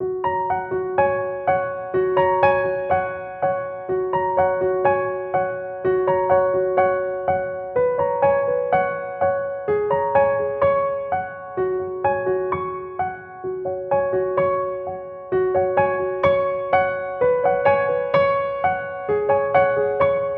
Brook Piano

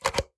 Plug Getting Connected To Wall Socket

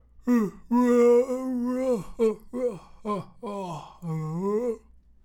Yawn

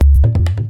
Techno Kick

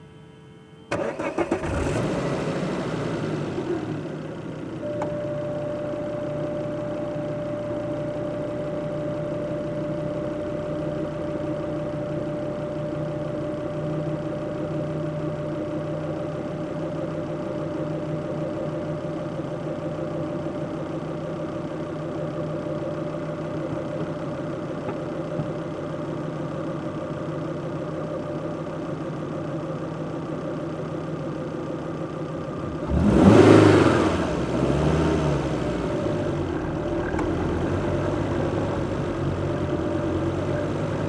Volvo S60 Start Idle Engine

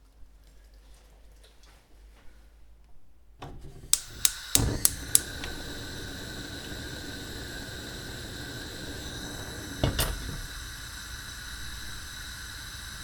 Gas Cooker Ignition In Kitchen

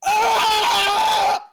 scream

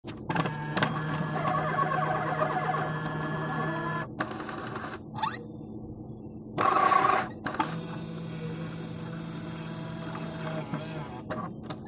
VHS Camera Start

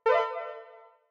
Ui Sweet Saw Sytrus Arpegio

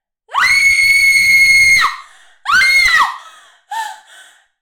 Screams In Fear

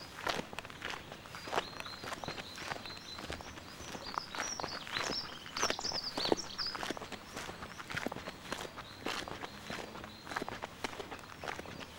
Saltwells Nature Reserve Walking On Gravel

